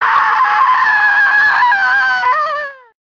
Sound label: impaled scream; deception; sindel